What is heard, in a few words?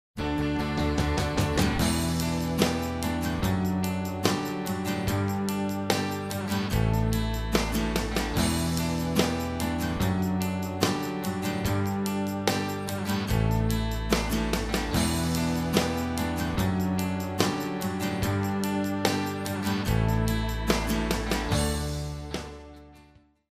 country